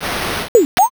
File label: gacha roll